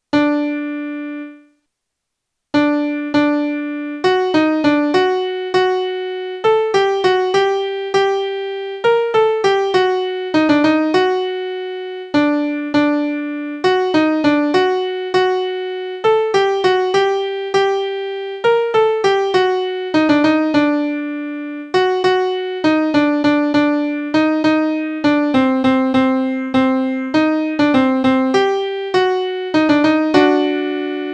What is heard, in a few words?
Havah nagilah piano